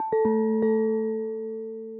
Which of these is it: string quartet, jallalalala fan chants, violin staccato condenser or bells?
bells